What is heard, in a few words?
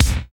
kicks